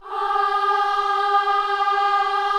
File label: KIDS CHOIR, Gospel; Spectrasonics; Vocal Planet